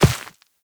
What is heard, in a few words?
Walk Dirt, Footsteps; Operator